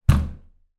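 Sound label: household door close impact bang wooden; zapsplat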